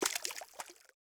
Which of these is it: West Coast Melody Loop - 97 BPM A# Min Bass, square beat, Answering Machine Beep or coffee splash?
coffee splash